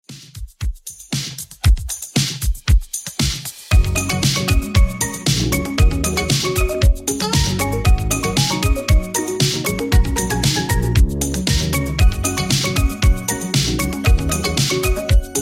80s Redrum 116bpm; remixplanet

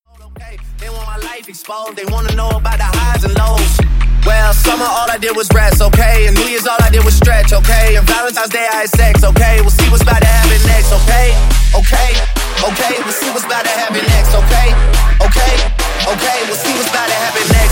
Hip Hop Dubstep; Remixfreakz

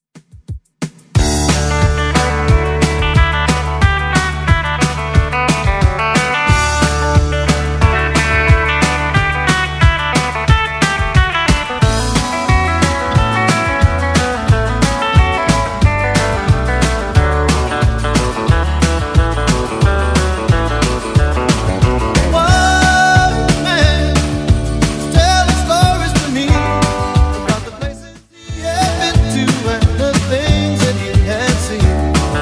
80s Redrum 180bpm

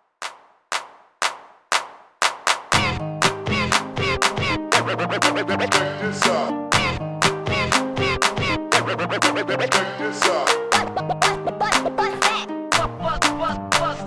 Rap HipHop Clap Starter 120 BPM

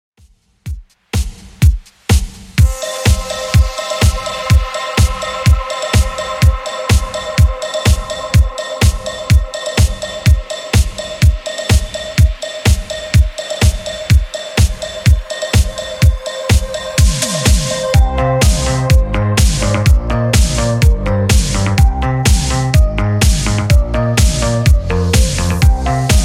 Redrum 125bpm CLEAN

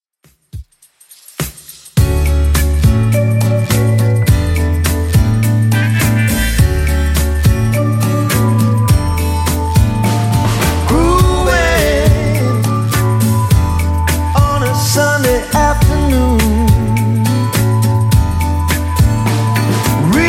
2ks Redrum 104bpm

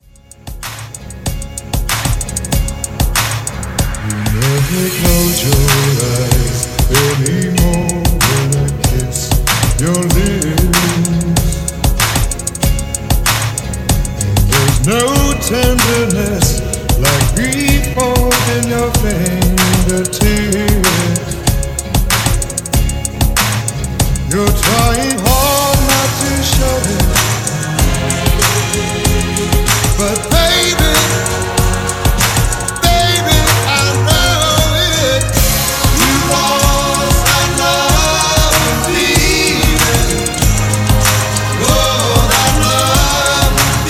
REDRUM 95 bpm Db

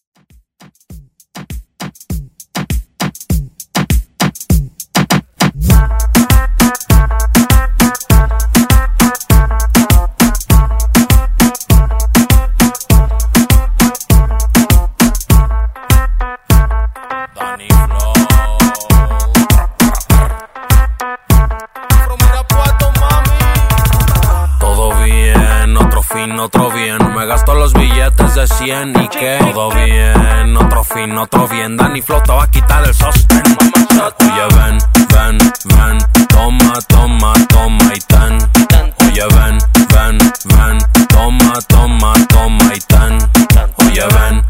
Reggaeton Redrum

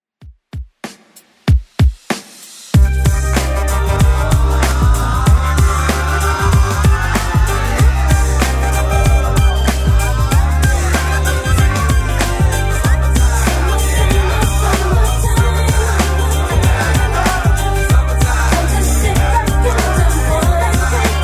90s Redrum 95bpm

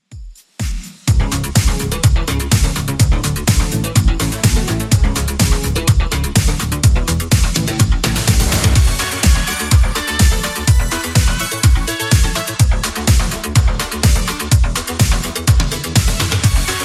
80s Redrum 125bpm

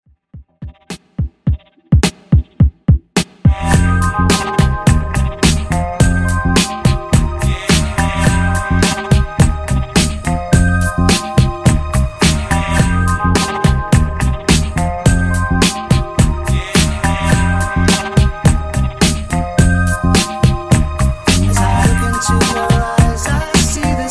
Remix Redrum

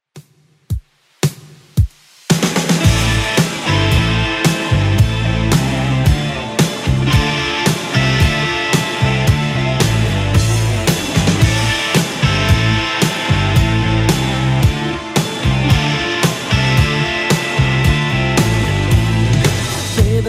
80s Redrum 112 bpm

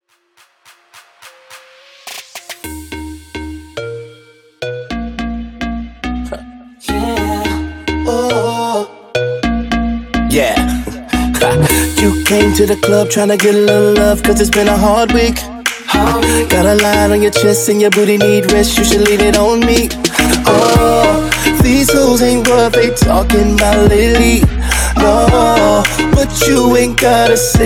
Twerk Moombahton Super Short

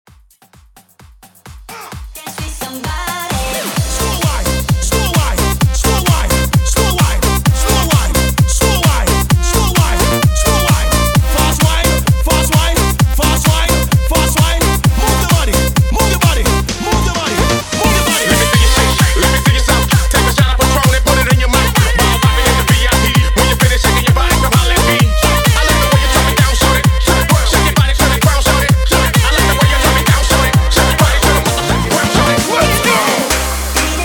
130 BPM

